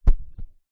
household phone cell samsung galaxy s9 in case throw onto bed mattress